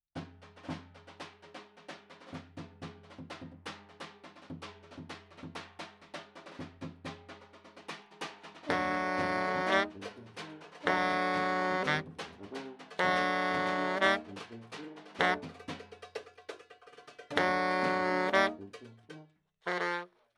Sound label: baritone sax